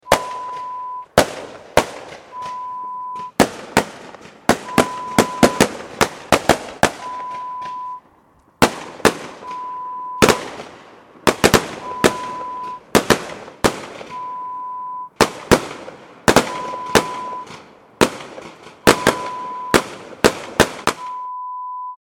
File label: Gun Fire sound